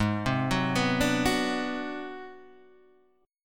G sharp 7th Sharp 9th Flat 5th G sharp down Guitar DADGAD, chord